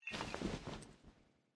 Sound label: cloth; smite; chaac